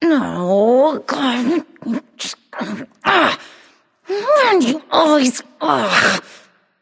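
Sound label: grumble, female; smite; voicepack; hera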